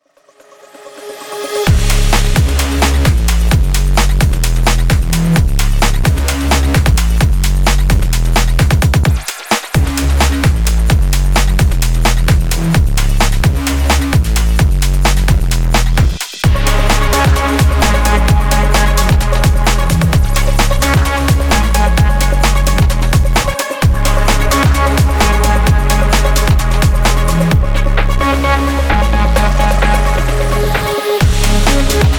sport rush

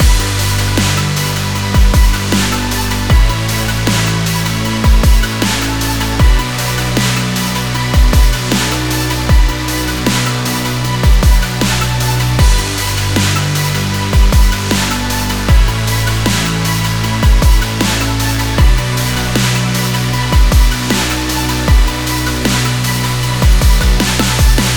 inspiring sports dubstep

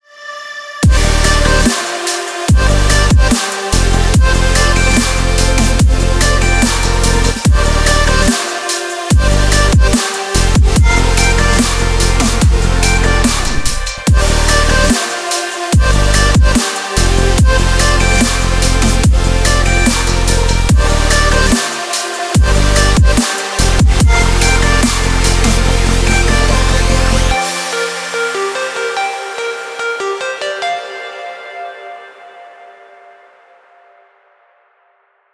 extreme energy burst

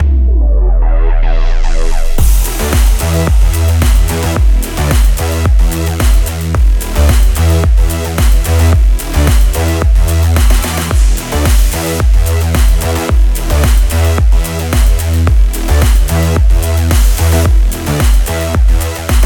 drive sport

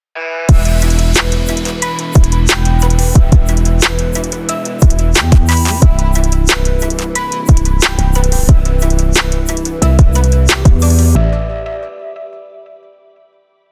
technology extreme sport

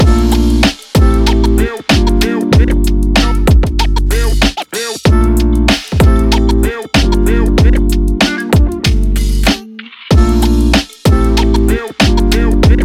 hip hop urban

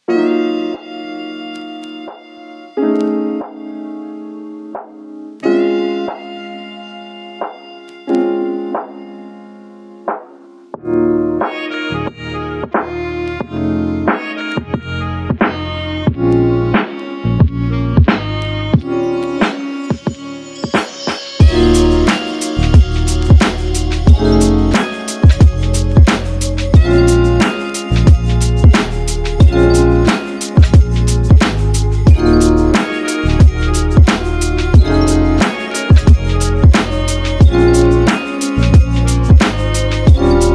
sexy chill

